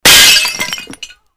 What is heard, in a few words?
glass pane smash